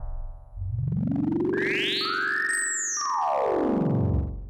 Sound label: Bird's Harp Welcome